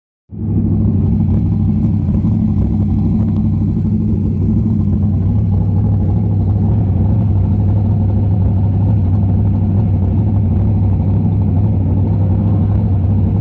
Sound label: Sprint car engine